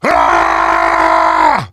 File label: raaaaaaaaah